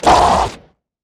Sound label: attack; Barotrauma; Crawlerbroodmother